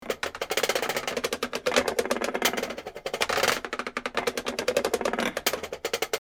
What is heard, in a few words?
falten for percussion; berlin